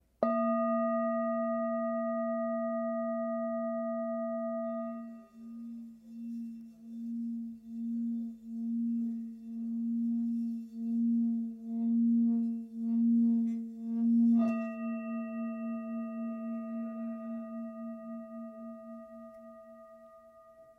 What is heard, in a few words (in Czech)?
tibetska misa; gyal stredni